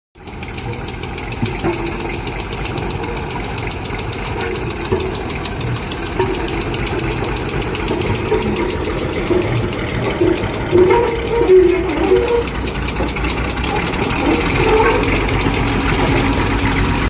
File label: Road roller